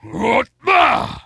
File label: Attack Light; Putress